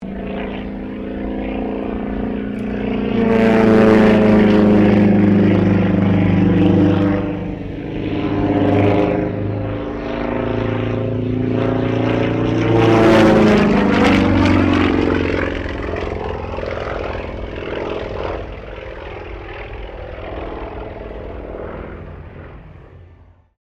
AIRCRAFT SUKHOI SU-26M PASS DISTORTION, SU-26m Acrobatic aircraft; Airplanes